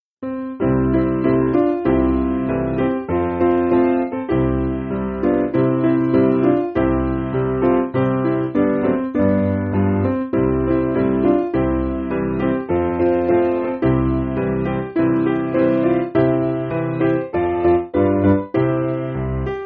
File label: God Sent His Mighty Piano; Kirkpatrick